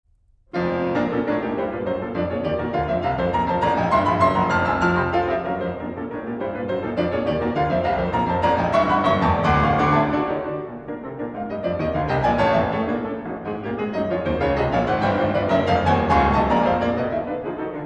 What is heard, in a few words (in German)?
Variationen b moll